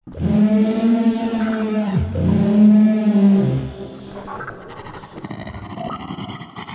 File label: dino roar